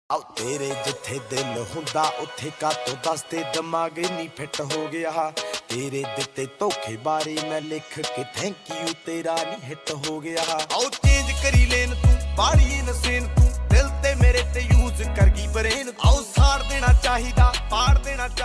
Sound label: Trending Punjabi Ringtone